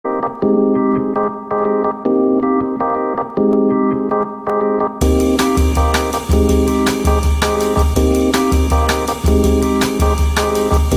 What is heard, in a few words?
Cafe Paris Lounge